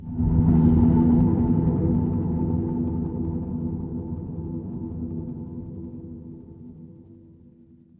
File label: horror drone